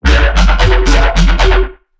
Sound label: bass hit Announcement F; Virtual Riot